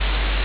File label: ambience room tone